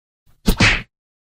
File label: fast punch